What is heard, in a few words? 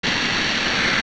Dodongo Inhale; zelda; OOT